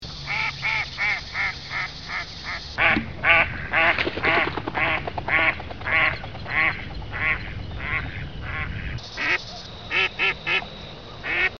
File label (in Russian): kryakva, birds